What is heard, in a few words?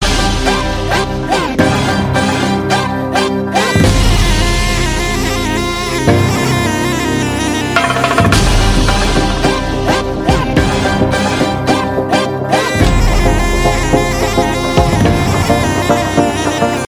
arabic tone